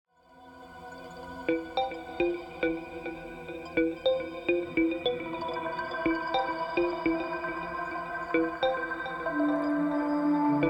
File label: DN patterns, Microfreak on top and Granulator; Going through old DN patterns; With some Microfreak on top and Granulator capturing in Live